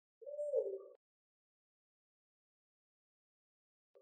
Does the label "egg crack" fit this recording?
No